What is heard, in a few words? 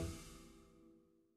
Loop Tail; Good Job